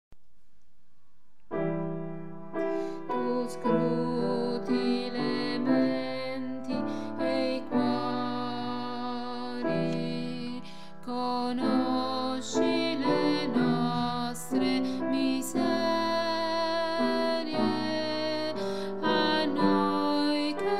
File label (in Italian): contralti; asolta creatore pietoso